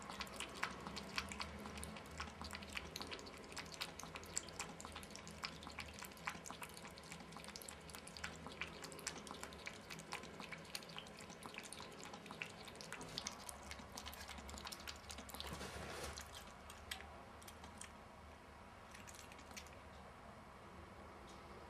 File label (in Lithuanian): nesusije su balsu laka vandeni